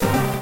Slots Stop; Pokemon Essentials; Pokemon Circon BETA 2 v1.8

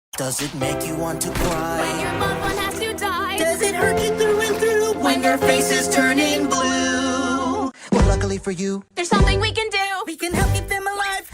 Jingle; C.H.E.R.U.B; Helluva Boss Original Soundtrack

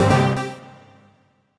Slots stop; Pokemon Essentials; PKMN Estelar 1.3

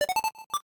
level up; Pokémon Estelar; PKMN Estelar 1.3; Pokemon Essentials; Voltorb Flip

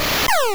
Dizzy Punch; Pokemon Essentials; PKMN Estelar 1.3

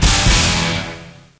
LIFT BORD; Full Throttle; ScummVM

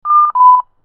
timeout; pygame; retrotrivia

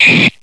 Cut; easyrpg; Ib

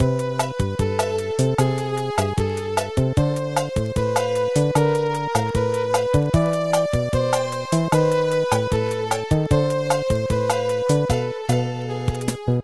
water; superbroswar

